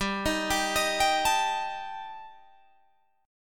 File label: G Suspended 2nd Sharp 5th, Guitar Drop D